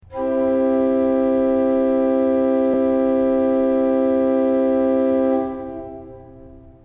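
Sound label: principal 8 C, organ; srednja vas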